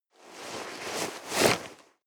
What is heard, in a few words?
nylon leather hard short